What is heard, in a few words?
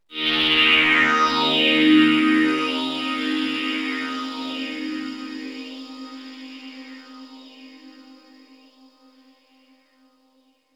Oneshot Synth